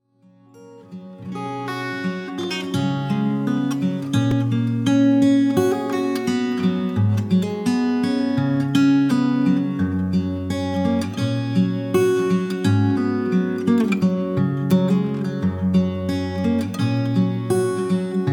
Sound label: Guitar